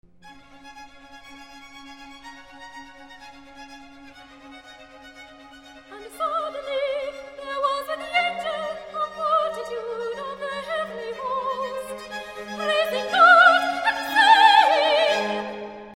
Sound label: And suddenly there was with the angel - Soprano Recitative; Boston Baroque; Handel Messiah